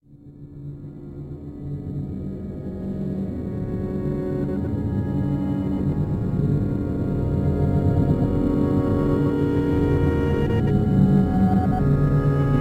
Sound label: Invisible Synth Solo III